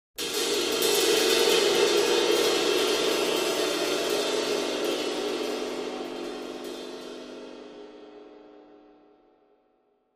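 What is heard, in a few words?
Cymbal Large Trill, drums cymbal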